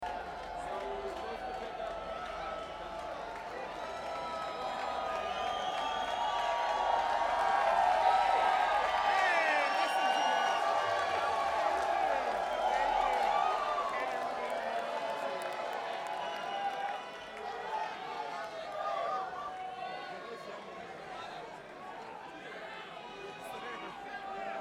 Crowd

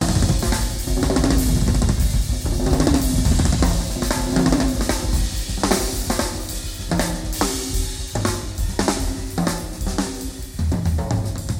Drums

